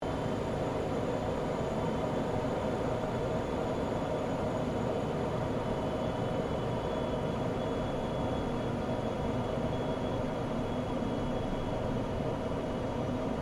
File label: AIRCRAFT GLOSTER METEOR F MK8 FLYING INTERIOR; Gloster Meteor figter jet; Airplanes; Vehicles; Aircraft Collection; Twistedtracks